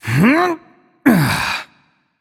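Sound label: nonverbal grunt lift; voicepack; smite; merlin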